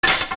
Spear Out

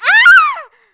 Fall; Tetra falling out of the tree; zelda sounds

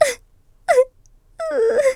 Cry; Princess Styla crying

